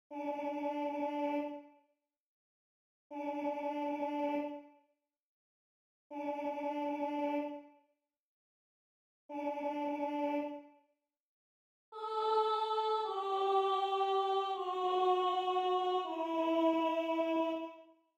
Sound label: altos; ensemble dancers